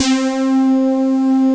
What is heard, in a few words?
RAVE; Pro-Rec - Synthsonic